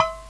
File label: TIN DRUM